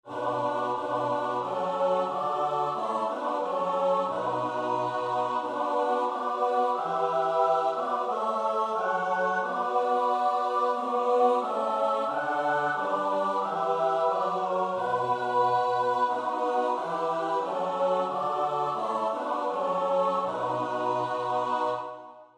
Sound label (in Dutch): S-A-T-B, koorpartij oefening; Vulpius (Melchior)